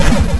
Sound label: snake bullet; mazeofgalious